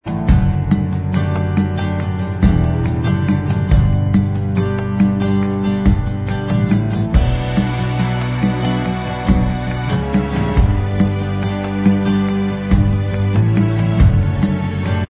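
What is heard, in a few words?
acoustic instrumental track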